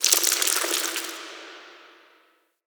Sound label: insect wet scurry; Ambience; Under City